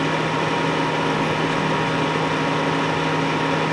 electric dehumidifier loop; stormwaveaudio

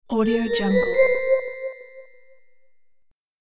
magical echo spell

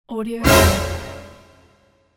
horror sound

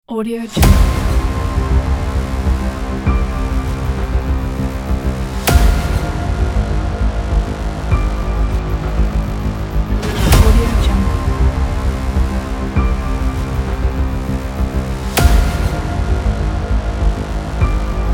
hybrid powerful intense cinematic trailer

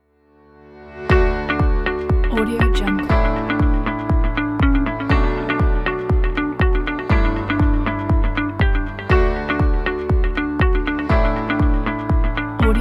corporate

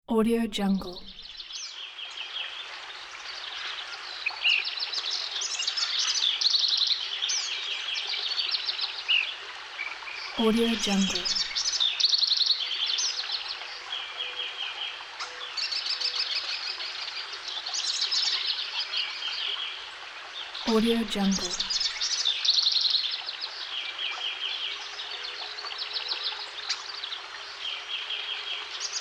forest river

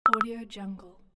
notification

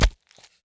stab punch hit

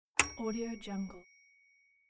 bell ring

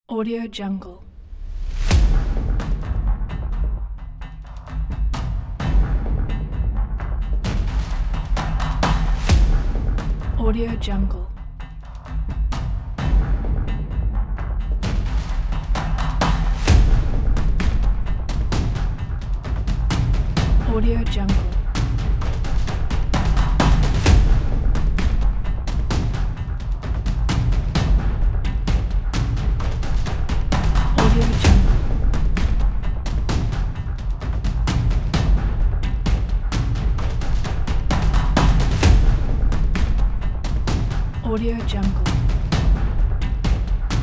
action industrial percussions

